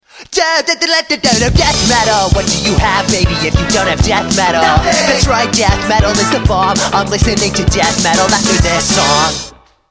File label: Death Metal